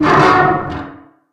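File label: metal frame groan heavy; Source Engine; Portal 2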